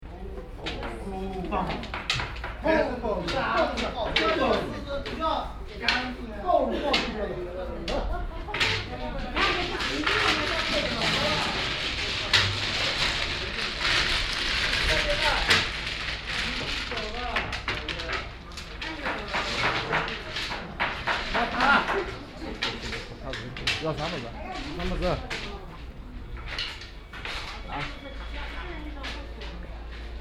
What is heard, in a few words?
mahjong